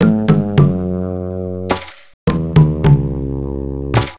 Sting Gen Bad; simanimals africa 2009 nds gamerip

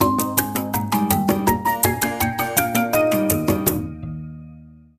Minigame Scrat Win

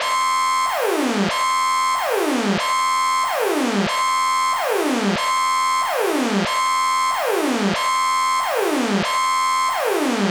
Siren; Choujikuu Yousai Macross Ai Oboete Imasu ka; Saturn

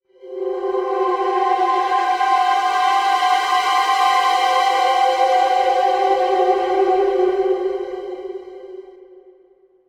Ind Foreboding; sid meier s civilization revolution 2 2015 vita

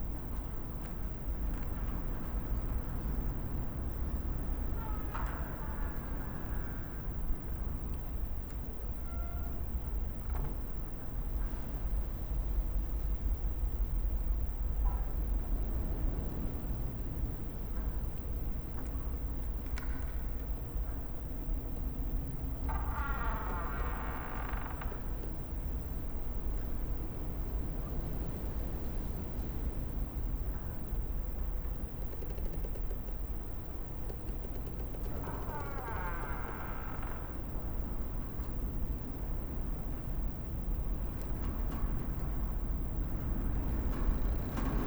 Ambience; Dark Circus; Five Nights at Freddys; FNAF Special Delivery; android ios

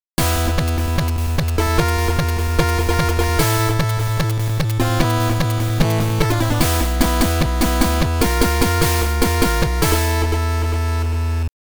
Heroic Bragging; Nettou Samurai Spirits; Dead Heat Fighters

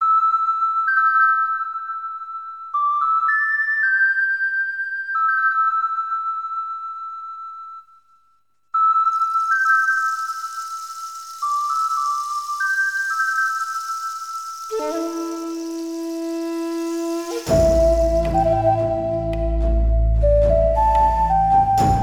Native Americans - Prairie; anno 1503 gamerip

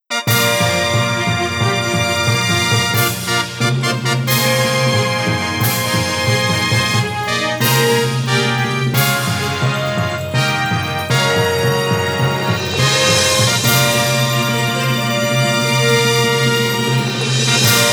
War Victory BGM; Genso Suikoden V Original Soundtrack

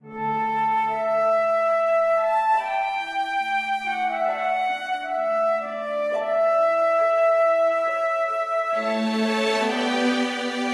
Surprise Stinger

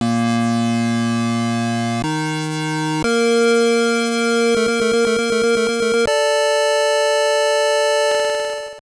Jingle; Indiana Jones and the Infernal Machine; GBC

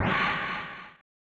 Gong; Tadaima Yusya Bosyutyu Okawari; SNES

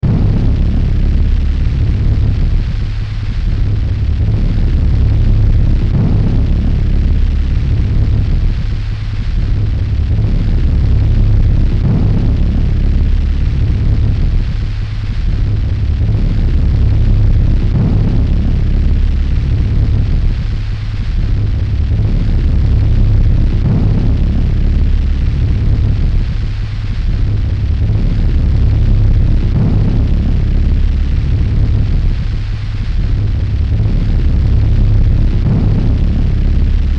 Fire; Yume Nikki; Kikiyama

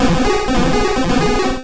Waterfall; Pokemon; Gen 1; attack moves; RBY

